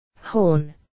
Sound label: horn